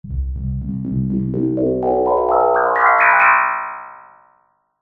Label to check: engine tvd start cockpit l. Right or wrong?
Wrong